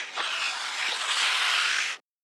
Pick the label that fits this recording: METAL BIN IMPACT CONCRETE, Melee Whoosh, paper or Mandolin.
paper